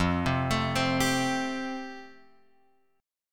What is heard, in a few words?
F Major Add 9th; Fadd9 Chord {1 3 X 2 1 3} arpeggiated